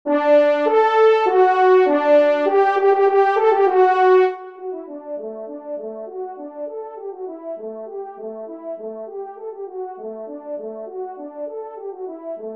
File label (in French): trompe; Le Veneur